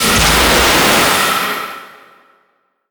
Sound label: Fumerol Blast